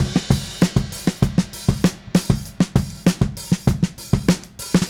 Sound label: Smooth Groove Drums Fill, 70s Analog Funk; Sonic Foundry (Sony Creative Software); What it Is!